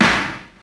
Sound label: short reverb rap steel snare drum E key, acoustic snare